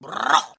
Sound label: brrrrrr, Voice